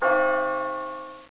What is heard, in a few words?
BELL